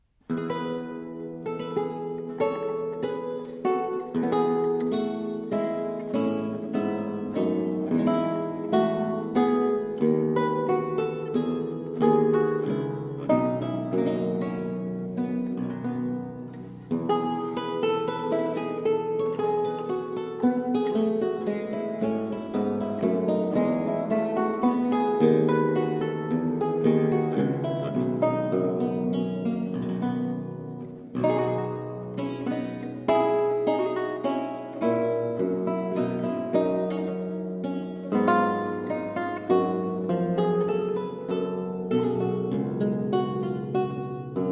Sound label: Menuette and Double in F Major, lute; Anton Logy